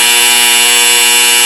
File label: buzz; whose line